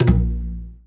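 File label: Ambience Partymode Crowd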